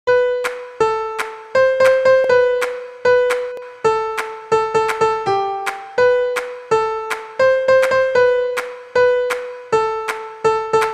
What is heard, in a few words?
ringtone piano cover